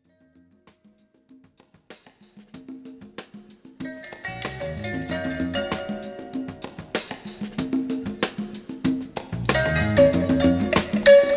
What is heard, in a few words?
Valiha